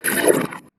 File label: weird, sfx